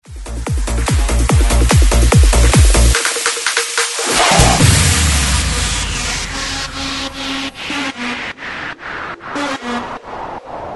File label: Bounce Smasher; DJDOLLS; remixplanet